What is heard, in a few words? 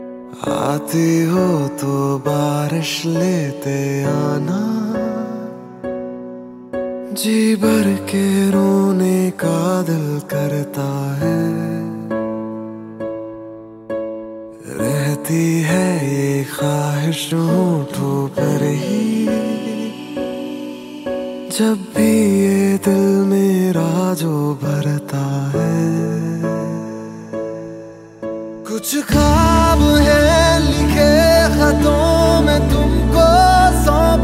Sad Hindi Song